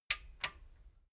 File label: metal button stereo click enable slow